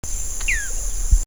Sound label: Elaenia spectabilis